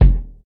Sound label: bass drum single hit f key, Royality free kickdrum sound tuned to the F note; kick drum samples